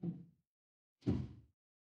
impact thud

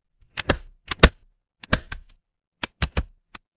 office stapler

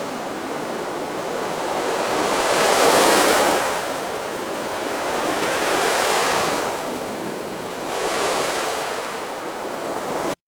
ambience ocean

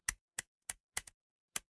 ui tick

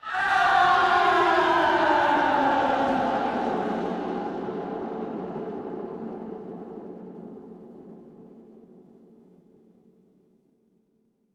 crowd cheer

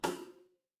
cartoon bonk

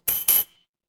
door doorbell

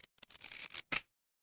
cartoon stretch

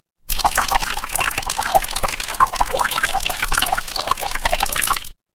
water stream

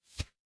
ui swipe

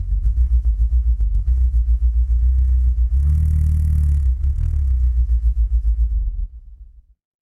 mechanical engine

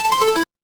retro game game over

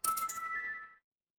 feedback success

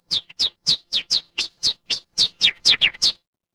animal bird

